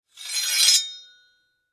Sword, Scrape, Blade, Metal; Warfare; Swords (Sound Effects:Combat); BlastWave

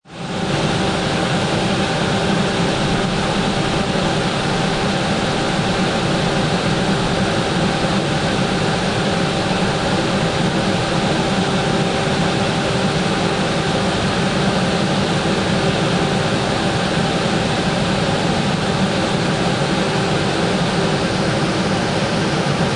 Industrial Fan; Fans; Lynne Music

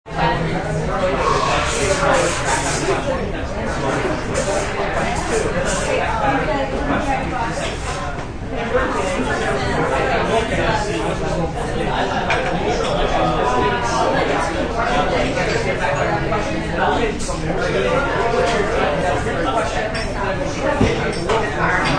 Bustling sounds of a busy café; Busy Breakfast Café; Restaurants

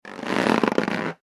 balloon rubbing squeak; Balloon Being Rubbed With Hands - Good Cartoon Stretching / Expanding Sound; foley; Balloons; McKinney Sound

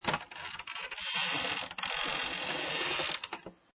Door Open With Creak; Door Slams

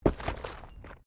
Body movement Impact Roll Gravel; Body movement foley to simulate falls and deaths; Rolling; Objects

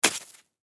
Ice Pick Chop Slush; Weather; Ice Sound Effects; Part of Ice (Sound Effects:Weather); Blastwave

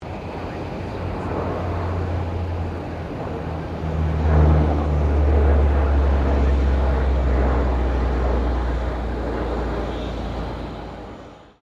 AP-3C Orion pass; RAAF Orion; Airplanes; Vehicles

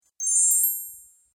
Magic Spell Gliss; Magic Sound Effects

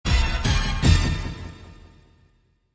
Synth Hits Intense Low Slow; Synths; Musical; Blastwave; Sound Effects:Musical

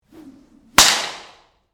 Bull Whip Snap; Whipping; Combat; Blast Wave

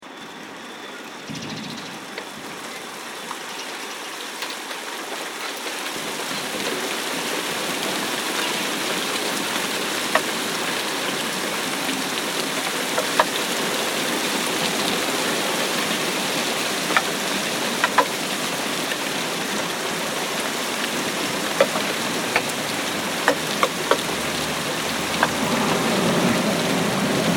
Rain Thunder And More; Thunder Sound Effects

